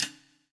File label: POWER RIM